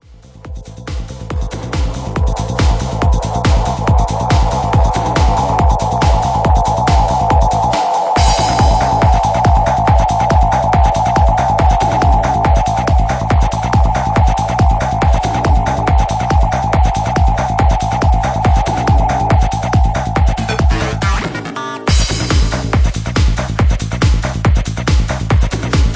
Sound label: acid echo; highoctane; wizzy noise